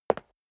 step leather shoes concrete single left

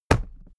briefcase plastic put down hard; industrie wirtschaft; bueroarbeit

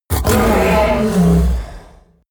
coloss roar loud deep aggressive; kreaturen

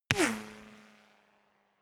gun shot ricochet drop

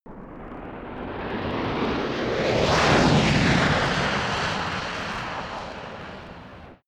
space passing rocket; raumschiff

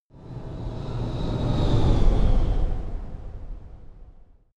sci-fi vehicle train passing; raumschiff

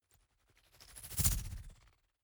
fly by insect drone fast

